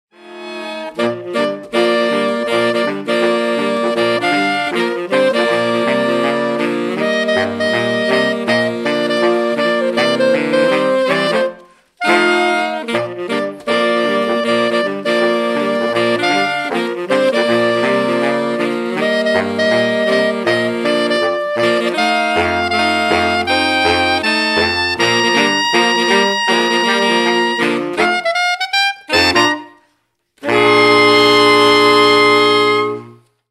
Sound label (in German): Jingle Bells Boogie Proben Mitschnitt